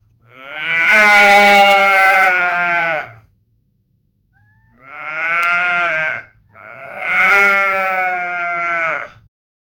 a bleating sheep that is; farm

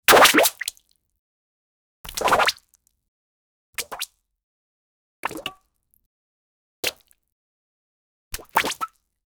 splish splosh pudding

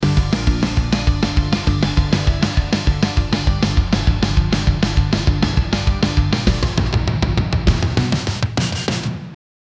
sound effect with a rock, news

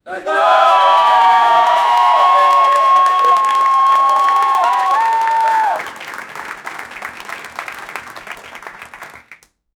studio audience erupts in

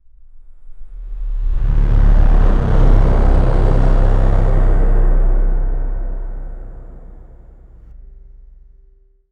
short deep sound of demon; death

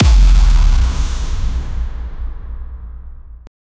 violent aura hit heavy ba

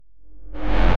buff gained energy pulse

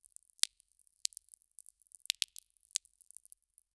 faint crackling sounds of; earth

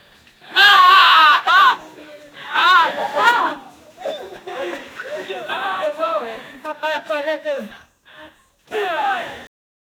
woman at bachelorette party

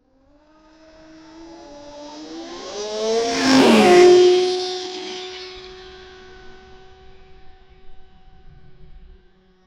a futuristic electric scooter

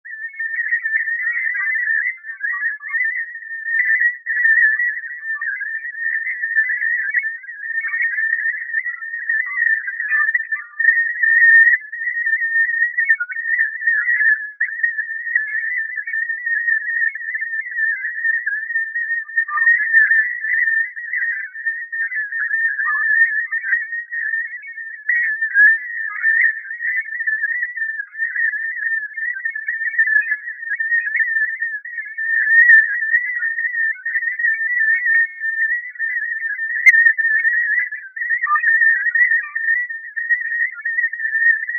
alien message

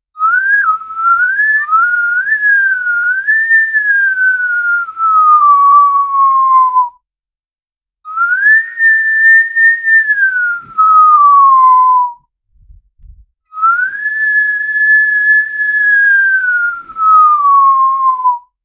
whistle

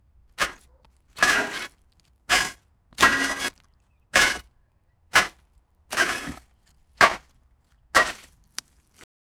dig into the ground with, tools

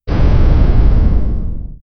a short 2 second burst, air release

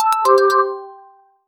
chill notification

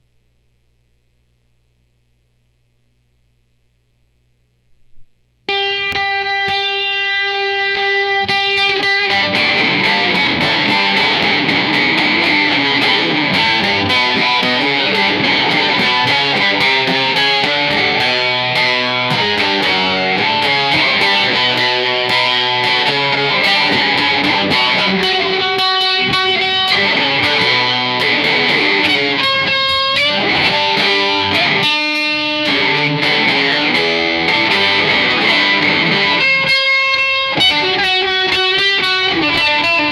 metal g, guitar

